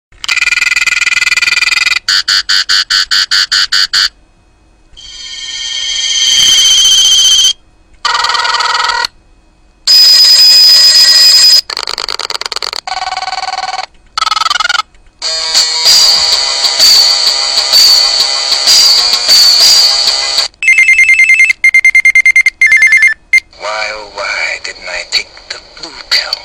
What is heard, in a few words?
ring mix; Matrix